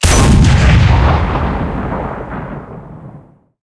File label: cannon 57mm zis4 shot, cannons tanks; War Thunder